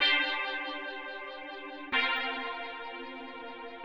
125 Soft pad C, Keys, Nu Jazz House; PowerFX - Nu Jazz House

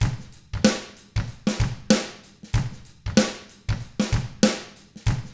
95 FUNK, Drums; ILIO; Double Platinum Drums 1

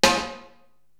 SD Ambi Hop, Korg TR-Rack drum machine

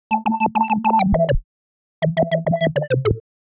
136 A, uk garage, Synths; 136bpm Lines n Loops

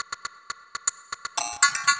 Rhythmic Fill; Sonic Foundry (Sony Creative Software); Loop Noir

